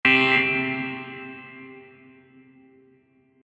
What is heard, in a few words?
Sports Buzzer